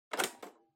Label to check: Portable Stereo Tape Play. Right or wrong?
Right